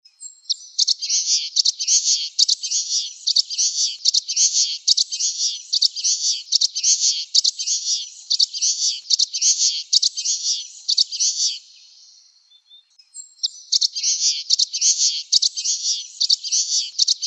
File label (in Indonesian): suara common waxbill